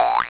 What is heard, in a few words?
bong; xfce